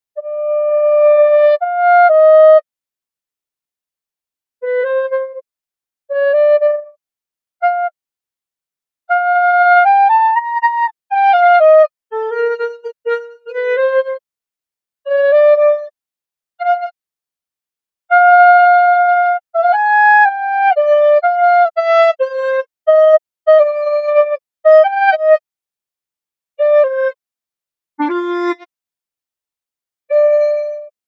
mozart solo

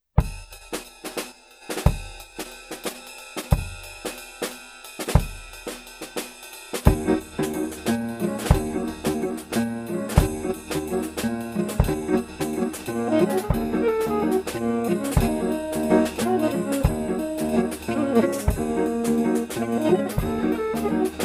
Noise backing

